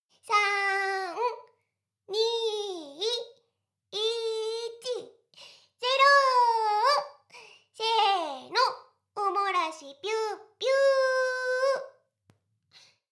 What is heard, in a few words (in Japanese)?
しこしこ; 全妹計画２; メスガキ妹; 全妹計画<オール・シスターズ> 2妹め